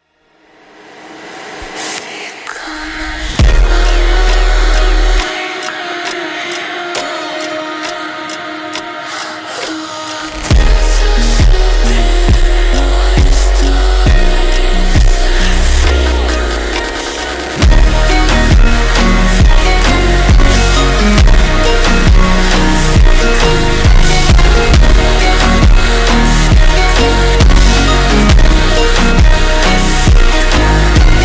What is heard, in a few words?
Funk super Slowed, funk